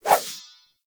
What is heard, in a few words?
Rapier Whoosh; Swords